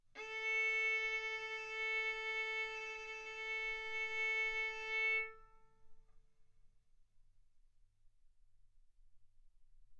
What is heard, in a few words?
Violin arco A4 B4; mf; sul A; stereo